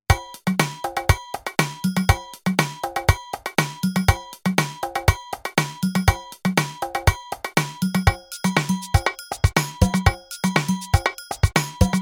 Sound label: song